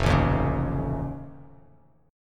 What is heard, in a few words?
F Augmented 7th F, Bass Standard; strummed; 1,0,1,6; down